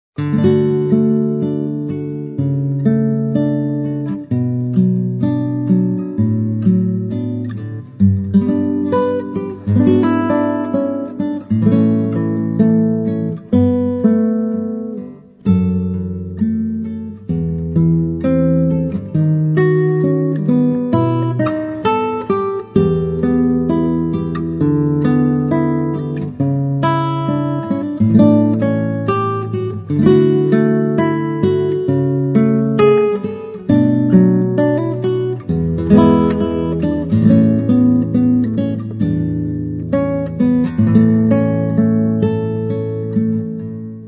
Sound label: solo guitar